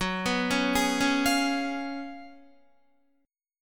Gb Major 7th Suspended 2nd Suspended 4th, Guitar, GbM7sus2sus4 chord; GbM7sus2sus4 arpeggiated; JGuitar